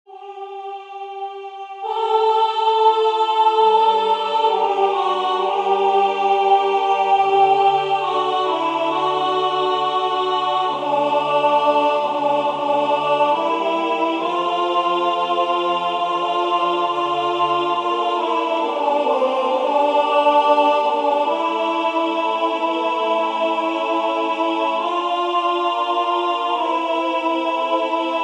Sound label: Christus factus est alto; Anerio